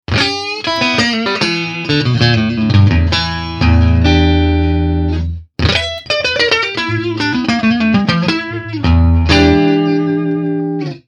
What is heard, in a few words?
strat vintage aluminum bridge clean all positions